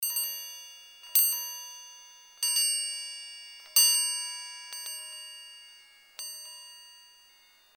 original clochette; Son clochettes original

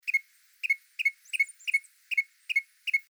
coucou deux octaves; Plus deux octaves

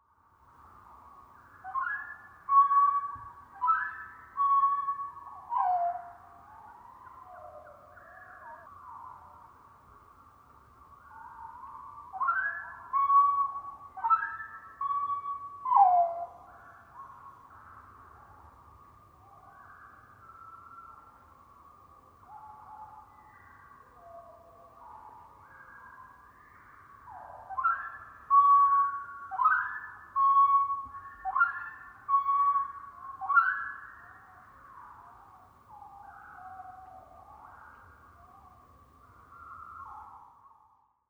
chant; Chant 3 bis moins 2 octaves

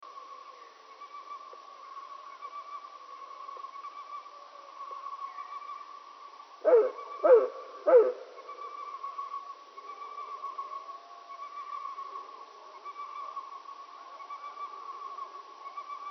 FIN D APRES MIDI RALENTIE; Le Pic en fin de journée ralenti de deux octaves

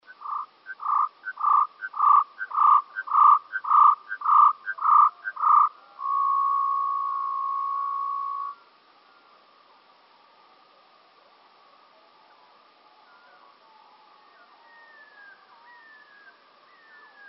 bruant; QUART DE LA VITESSE

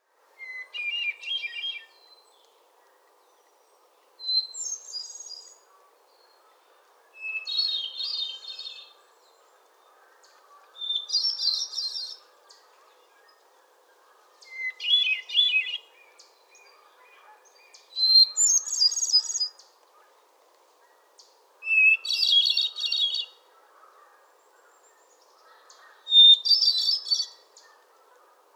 solitaire bernache

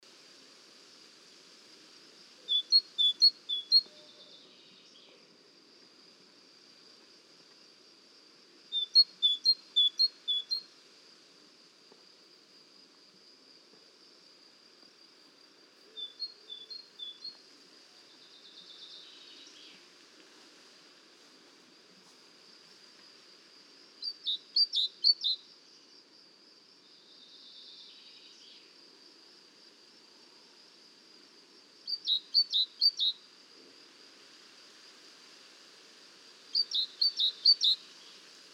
deux chants mesange; Deux chants enchainés

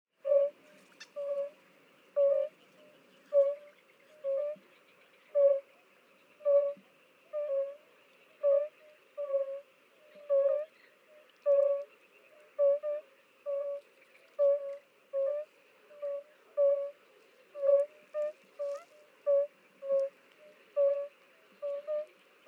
sonneur

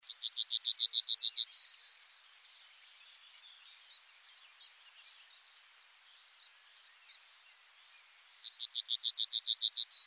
bruant original; CHANT ORIGINAL

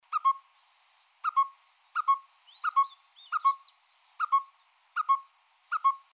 coucou une octave; Plus une octave; L'INDICATEUR N°1

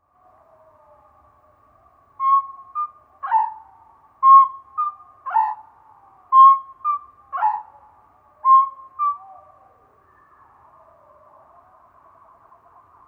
chant; Chant 5 moins 2 octaves

